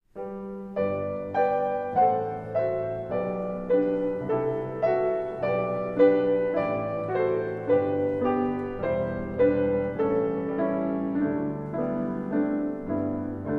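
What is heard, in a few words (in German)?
Fassung für Chor und Klavier zu vier Händen; Ihr habt nun Traurigkeit...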